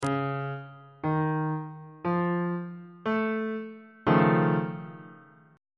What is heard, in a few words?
f augmented 7th 2nd inversion on bass clef